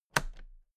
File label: toolbox latches close heavy